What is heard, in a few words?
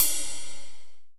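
Closed Hi-Hat, Dance Drums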